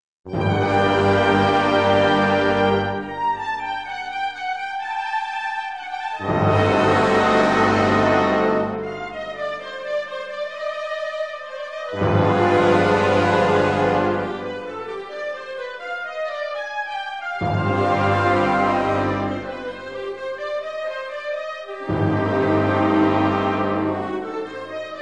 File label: Fantasia & Fugue In C Minor